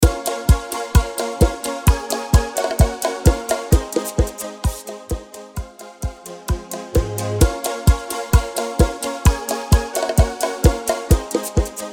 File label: intro outro break 130 BPM